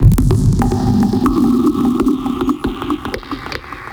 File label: Various Bubbls